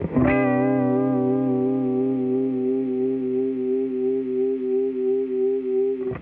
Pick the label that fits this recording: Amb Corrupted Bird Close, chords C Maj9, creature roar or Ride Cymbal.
chords C Maj9